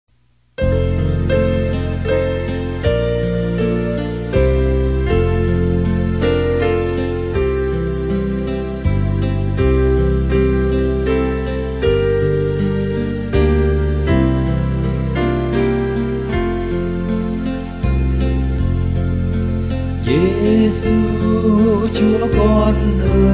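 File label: soprano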